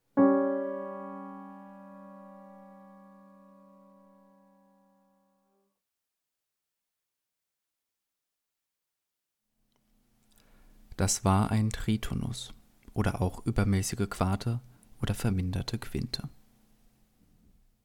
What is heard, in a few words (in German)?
Tritonus simultan; interval